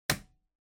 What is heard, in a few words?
Light Switch Rocker, Dry Hard